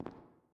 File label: Footstep Glass; Among Us